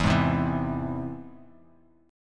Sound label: E Augmented, Bass Standard; E+ strummed; JGuitar